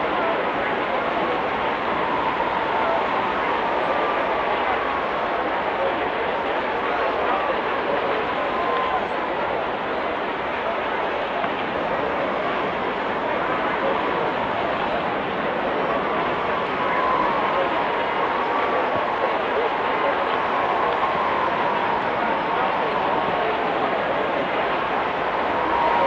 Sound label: crowd arena; Blind Gladiator